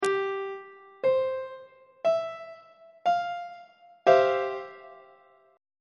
e sharp major 7th suspended 2nd 1st inversion on treble clef